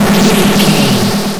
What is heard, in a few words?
retro laser beam